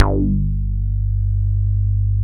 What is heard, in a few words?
MOOG, HIPHOP; Rubber Chicken Software Sample Bank